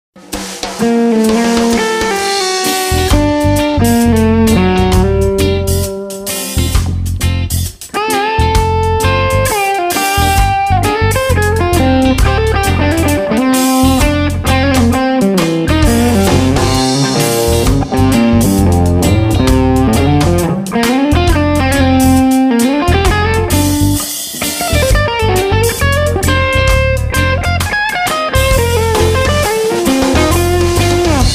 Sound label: Just Amp OD; Fuchs 30